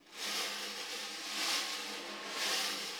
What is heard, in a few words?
Vintage Snare Drum; Q Up Arts; Johnny.C's Vintage Snare Drum; 5.5X14 SLR